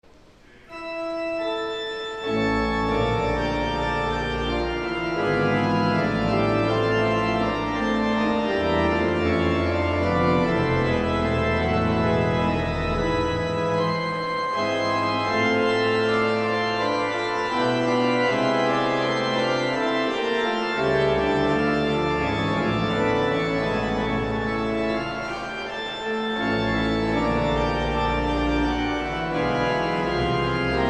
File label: Organ, Chorale Prelude; Johannes Brahms; 'Herzlich Tut Mich Verlangen'; Second Sunday in Lent; St. John's Cathedral, Jacksonville Florida